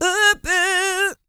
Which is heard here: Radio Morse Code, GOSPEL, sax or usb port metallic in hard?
GOSPEL